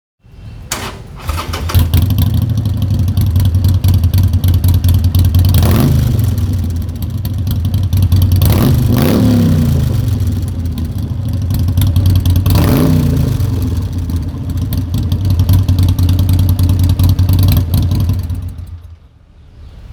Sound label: Hd custom 1200 vance and hines